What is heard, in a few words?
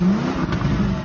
vrroooaooum; BALLY; CORVETTE; Corvette-Original